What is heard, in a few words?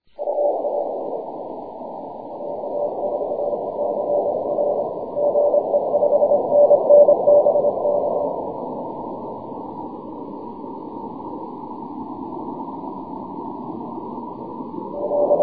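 12m continuous wave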